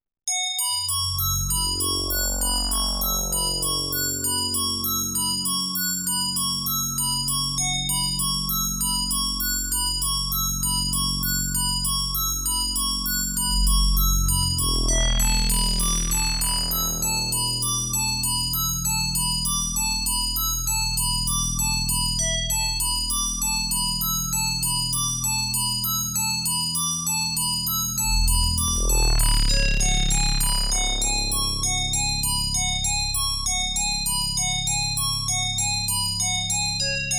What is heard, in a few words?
OPL3; CYBSPHERE